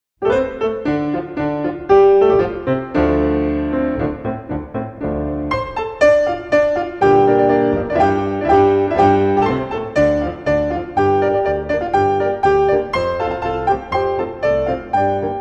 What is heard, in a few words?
Black Horse Piano duet